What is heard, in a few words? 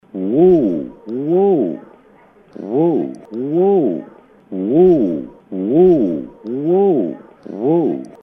wooooooo